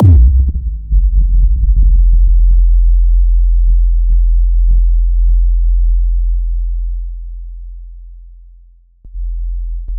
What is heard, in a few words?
breakdown, bass music; hushescraft